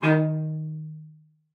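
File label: cello spiccato D#5, cello; Xsample VOL-5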